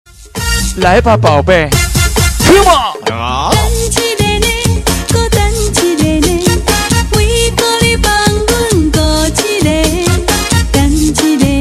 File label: taiwan techno